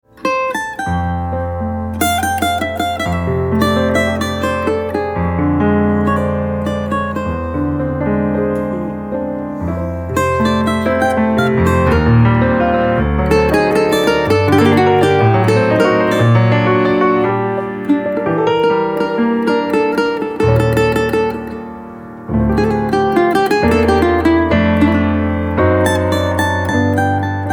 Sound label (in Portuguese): Cavaquinho